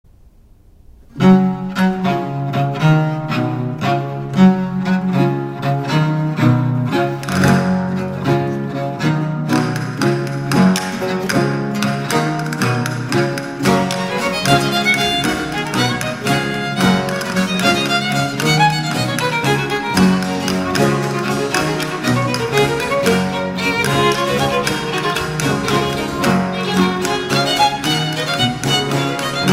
Ciaconna (Chaconne) for 2 violins, theorbo; Falconieri, Andrea

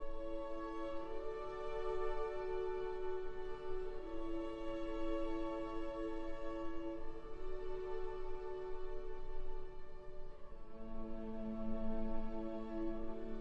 for cello and strings

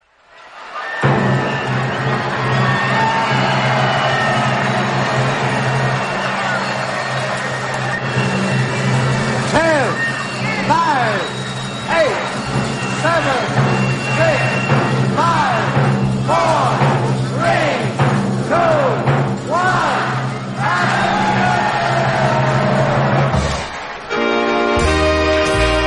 Big Band Dance Party; Swing Street New Year's Eve

